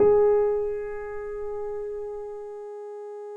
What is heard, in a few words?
PNO PP; Synclavier Sampler Library